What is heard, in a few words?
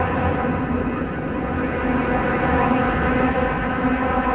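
ambience tunnel tram loop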